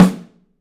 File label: PICOLO; 3D Drums